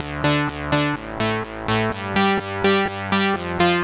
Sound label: teuton parse D 125, synth loop; Zero-G; Soundclash; Nu Dance Electro